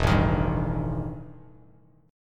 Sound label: E Minor Major 7th Double Flat 5th E Bass Standard; strummed; JGuitar